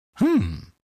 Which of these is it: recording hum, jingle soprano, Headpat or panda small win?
panda small win